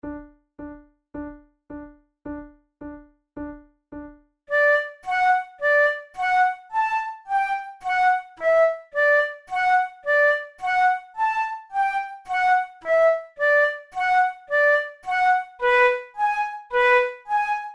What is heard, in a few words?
methode de flute; tulou